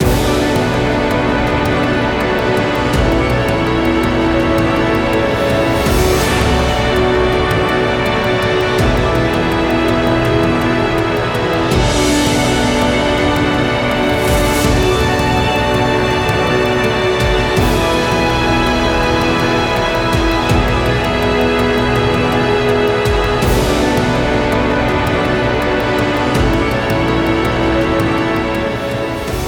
Bgm Strategy Wind; Yu-Gi-Oh Cross Duel; Android

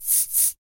Scratching

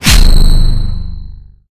Pickups Powerups

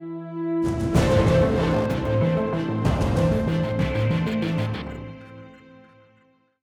190bpm; Legends of Pegasus; windows gamerip 2012

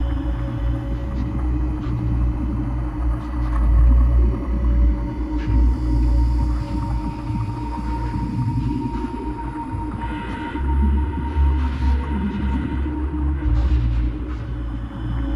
sounds music; Halo Combat Evolved; Xbox

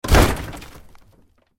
Metal Hit Large; monster truck destruction; android

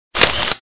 Door Sound; Planet's Hardest Game 3

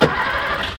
Coin; Storm Racing G

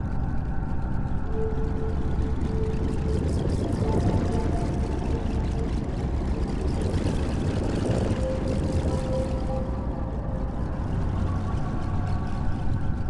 sounds music; Halo Combat Evolved; Xbox

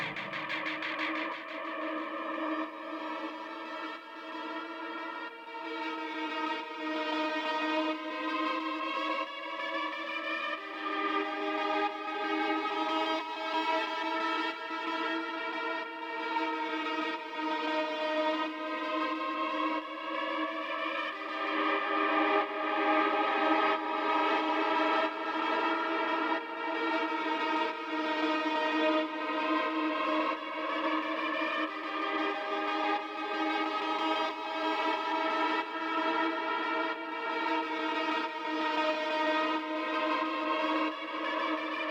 Synthwave 91bpm Guitar and Ambience; hot lava; ios macos windows

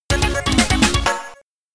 Grassy Plains Jingle

